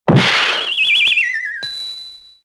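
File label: explosion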